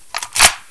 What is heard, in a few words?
9mm clip; wartso